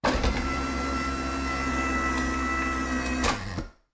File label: close top, vehicle; Cosmic Rage; The Experience-light